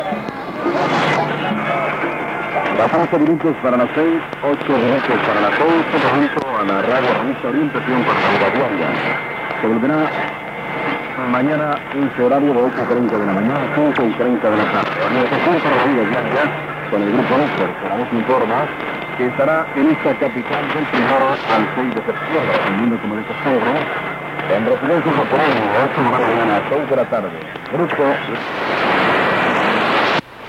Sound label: radio; cassette